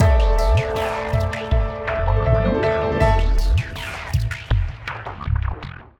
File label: JINGLE